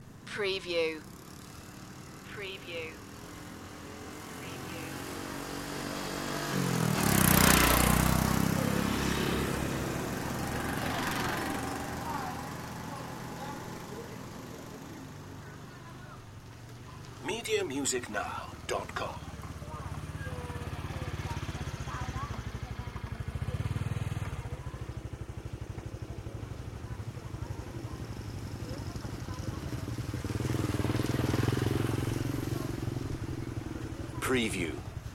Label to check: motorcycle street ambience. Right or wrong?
Right